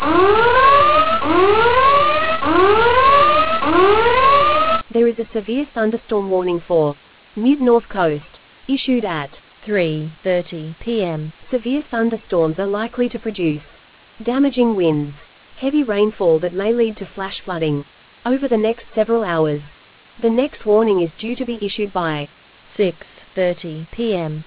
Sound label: storm audio